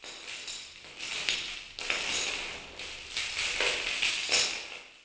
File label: chains; AMBIENT; JAIL; Pirates Of The Caribbean